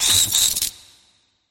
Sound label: cry